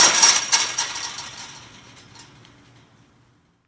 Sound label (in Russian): drebezzhanie kreplenij posle scepki vagonov poezda